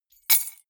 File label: key ring large drop stone; objekte; schluessel